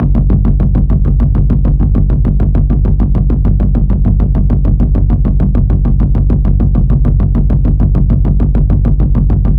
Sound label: Trailer Pulse C 100BPM; Toxic-Zone; Ghosthack